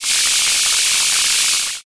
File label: shower